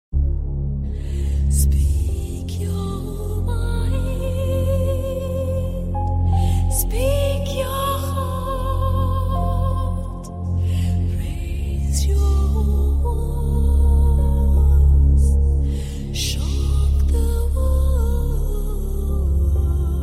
Background Music

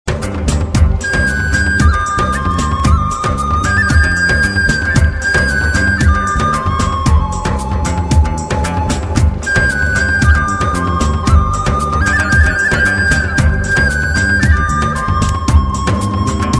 Digital Flute

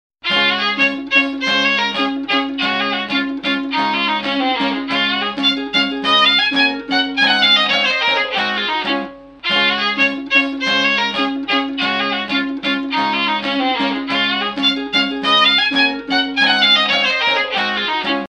Beautiful Rhythms

